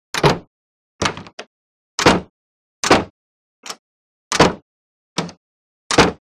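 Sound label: Carriage Door Open Close Train, diesel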